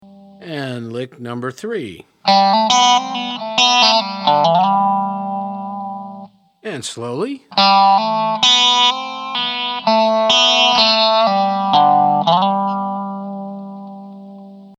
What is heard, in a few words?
resonator pedal, steel guitar